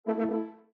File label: Windows Hardware Fail; abbottabad compound